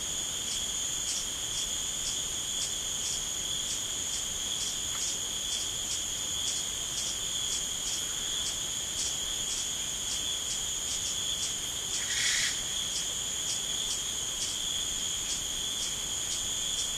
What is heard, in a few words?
birds; Golden Crayon Sounds